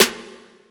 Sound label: snare oneshot, Pop Sample Pack; Slate; SD PASADENA Pop Sample Pack